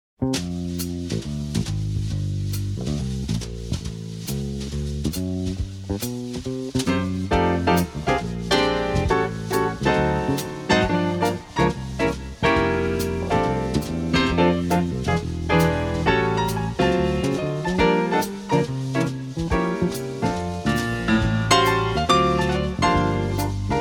christmas kit instrumental beds o tannenbaum

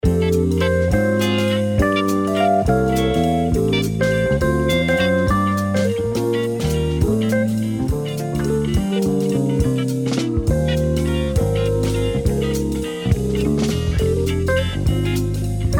christmas kit instrumental beds

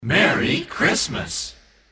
christmas kit group shout merry christmas slow

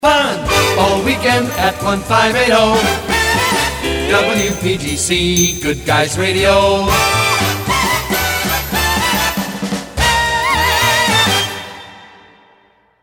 jingles, all weekend at 1580; pams; series 27 jet set

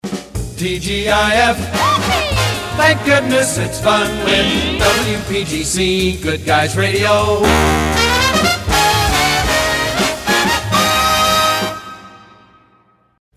jingles, thank goodness its fun; pams; series 27 jet set

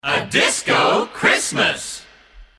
christmas kit group shout a disco christmas

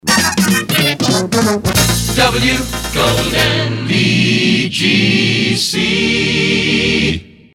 jingles resings golden; pams

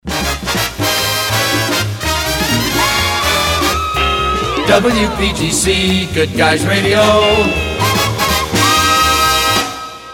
jingles no jet sfx; pams; series 27 jet set

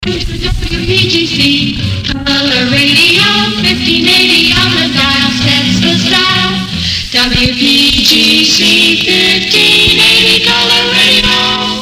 jingles highland youve found the sound restored; pams series 9

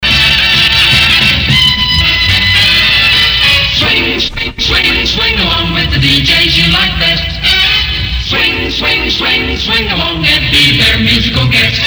jingles swing along roll call; futursonic

